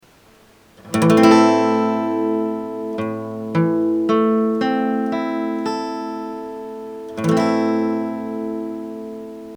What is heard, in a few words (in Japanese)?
Am バレーコード